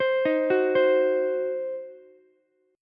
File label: C Diminished C, Ukulele; arpeggiated; JGuitar